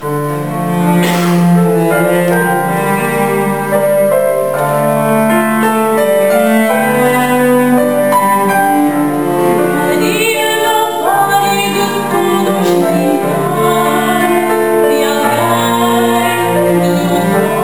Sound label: ringtone